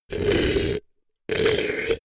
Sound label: beast choke deadly short